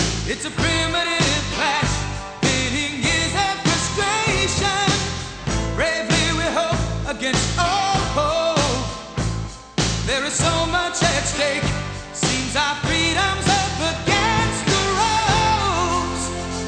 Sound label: rock